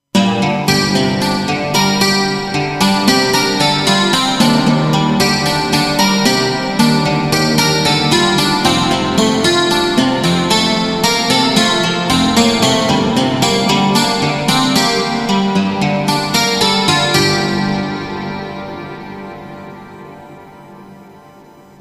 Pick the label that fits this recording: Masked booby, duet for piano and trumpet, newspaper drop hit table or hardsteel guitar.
hardsteel guitar